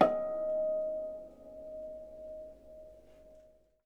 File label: H D#5, Percussion & Harp; Miroslav Vitous